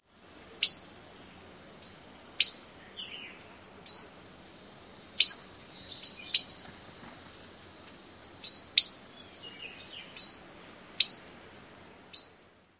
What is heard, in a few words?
pit call